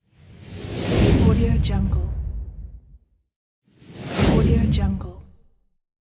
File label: whooshes; transitions and movement